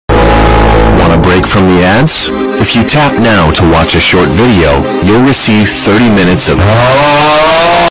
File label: auggh; ad break